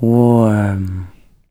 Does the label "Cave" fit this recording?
Yes